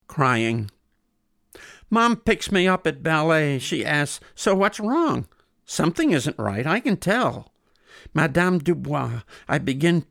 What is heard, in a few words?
Crying